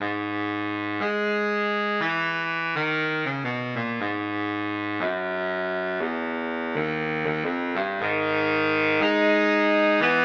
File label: Steel Sax Quartet